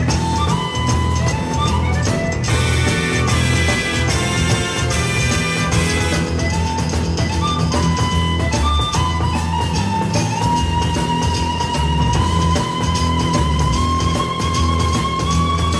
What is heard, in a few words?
Fanfare